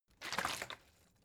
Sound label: bottle water sparse shake single soft